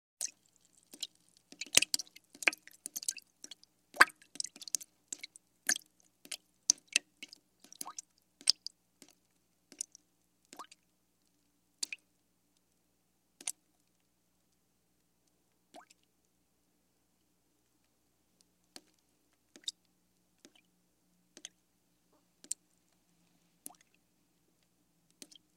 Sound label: Drips Heavy Fall into Liquid; water